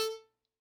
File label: Hohner Special20 F normal release A3, harmonica; Aerophones; Releases; Free Aerophones